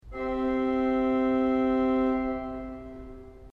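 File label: geigenprincipal 4 C, organ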